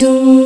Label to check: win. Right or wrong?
Wrong